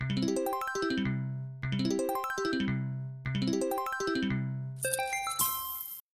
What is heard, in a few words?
win animation